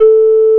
OB8 BLOW A3, OBERHEIM OB8, Vintage Keyboards; Big Fish Audio; First Call Vintage Keyboards

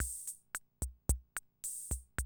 CR78, DrumLoops

